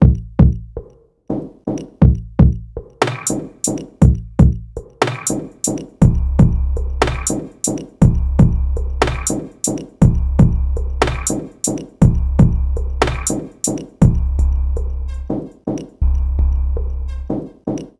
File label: Digitakt Sample; Digitakt Sample Pack 1 DEMO